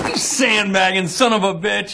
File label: sob; Snatch